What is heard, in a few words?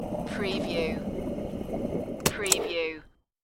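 Gas Pump Off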